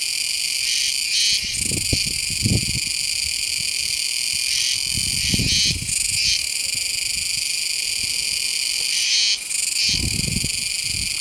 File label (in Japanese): 蝉がうるさい; 屋久島; 宮之浦